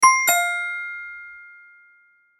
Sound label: chime disconnect; Multimedia; Chimes; Blastwave